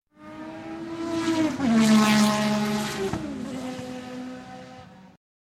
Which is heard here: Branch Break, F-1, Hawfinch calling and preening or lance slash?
F-1